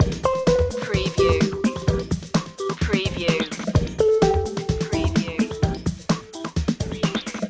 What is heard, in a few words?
follow the bouncing ball loop